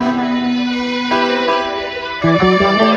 keys and strings; loops; Phonky

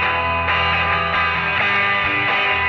guitar, Phonky Loops

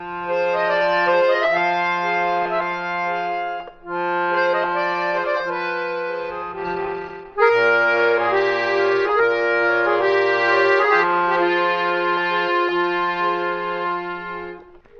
keys organ grinding, sad loops

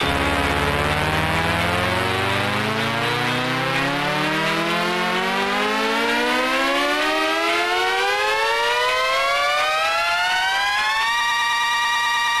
powers up, Zaps and Beeps

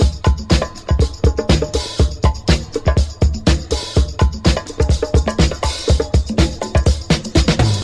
timbals tap, Funky Drum loops

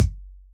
Kicks Soft

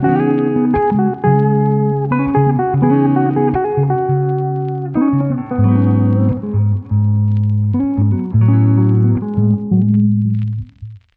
guitar, Jazzmatazz loops

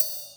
Hats Real Open

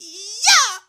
eeeyah female, voice; ooh ahh

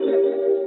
echo vibe, Synths; Notes

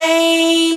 ayyyyyyy, Voice

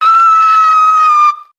scream

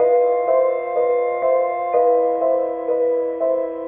keys building tense, sad loops

